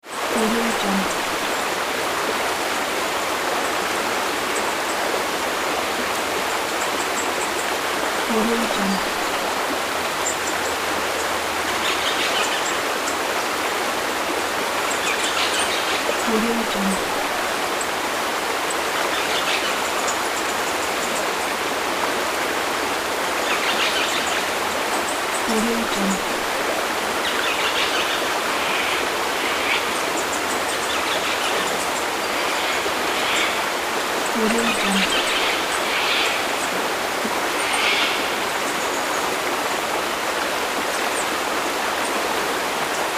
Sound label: river